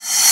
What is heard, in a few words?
Reverse Cymbal; Vengeance Samples; VEH1 Cymbals